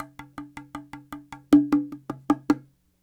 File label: Cahon